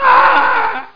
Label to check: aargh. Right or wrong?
Right